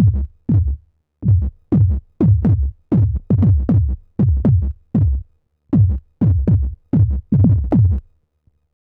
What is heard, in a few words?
mopho percu bassdrum; davesmith mopho